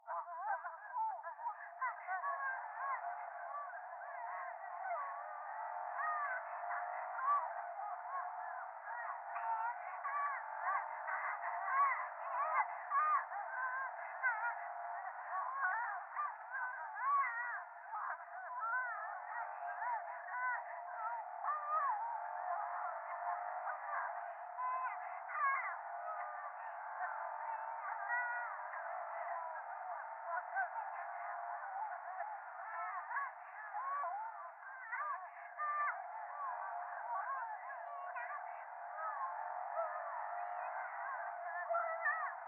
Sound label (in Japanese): 電話 低音喘ぎ 車の中